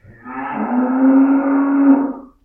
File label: cow